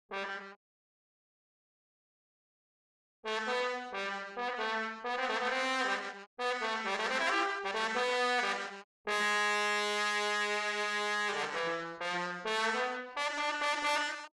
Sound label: Trombone; Holst Jupiter